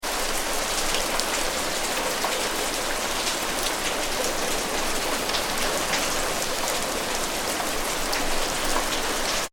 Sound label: weather rain med drips street, Rain (Medium) With Dripping; Rain ( Medium ) With Dripping On Hard Surface; McKinney Sound